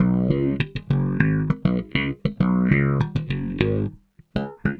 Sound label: Bass